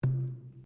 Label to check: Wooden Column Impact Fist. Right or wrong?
Right